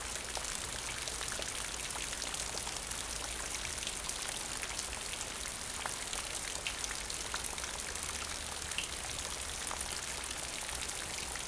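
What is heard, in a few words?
rain splatter pool loop; Star Wars Obi Wan; XBOX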